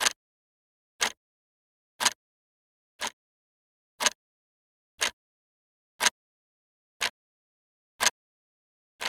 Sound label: clock second hand